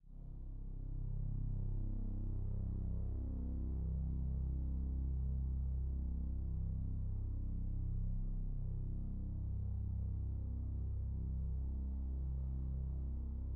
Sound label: Like A String Bass